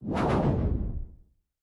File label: throw away